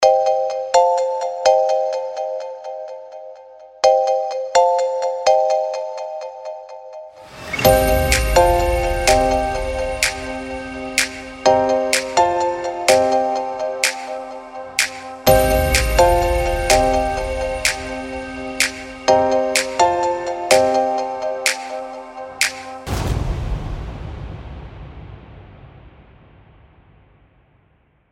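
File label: iphone ring song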